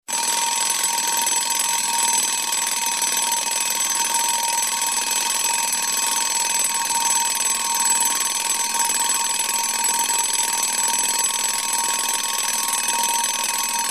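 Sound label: sound of an old alarm clock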